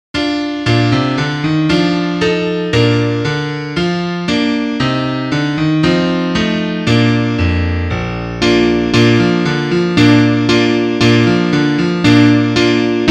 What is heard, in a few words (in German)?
Klavier; Liederbuch; ihr kinderlein kommet